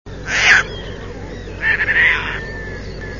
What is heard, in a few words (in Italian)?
sterna maggiore